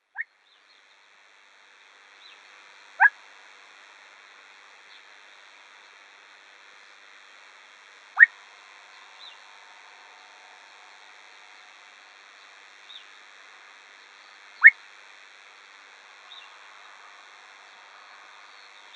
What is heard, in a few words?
Golden Pheasant calls